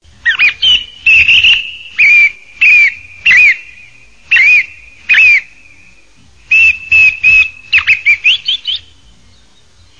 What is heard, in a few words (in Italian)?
tordo